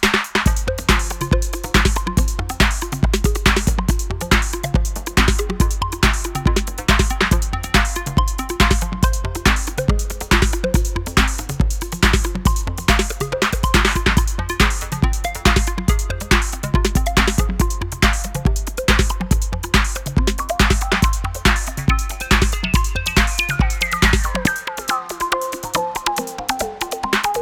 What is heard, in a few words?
ringtone; miami